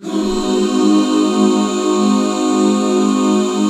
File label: Ab 7; Best Service Gigapack 1